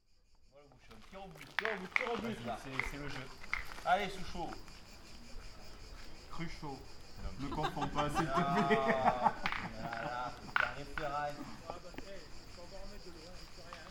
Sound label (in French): le chien derriere la voiture